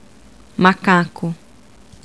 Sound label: monkey